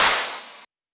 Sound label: Clap; Vengeance Samples; VEC1 Claps